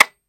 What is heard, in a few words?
weapon disconnector; gmod; viper